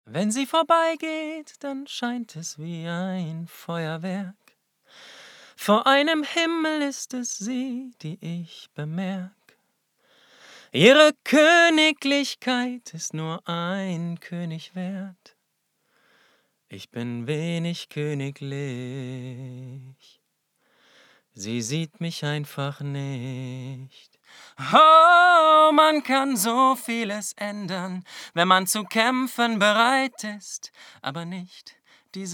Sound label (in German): Musical Gesang; Musical Deutsch